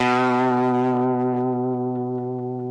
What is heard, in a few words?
guitar onenote vibro fuzzy